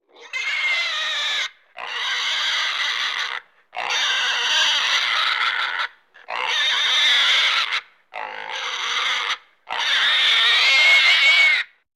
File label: Pig Squeals Higher pi